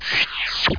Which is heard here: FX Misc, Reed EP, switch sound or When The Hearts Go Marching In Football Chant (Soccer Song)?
switch sound